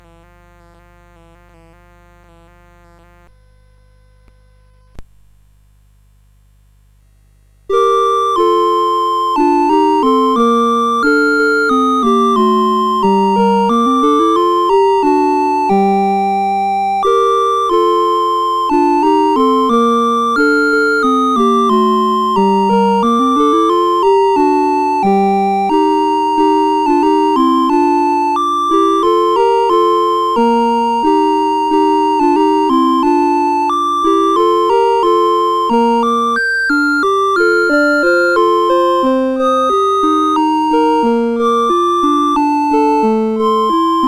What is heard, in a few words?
CSG8580; CSG8580R5